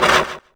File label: saw